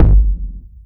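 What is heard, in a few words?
bass drum, right; Zero-G - Chemical Beats; BASSDRUM ZOO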